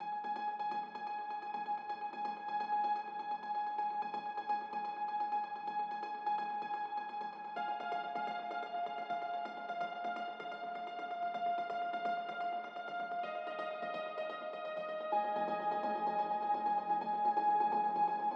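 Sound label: 127 BPM G# Minor Piano, Euphoria; Cymatics; GHOST (Beta Pack); Allured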